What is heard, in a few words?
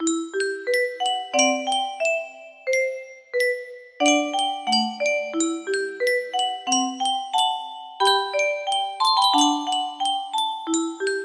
musicbox, music box melody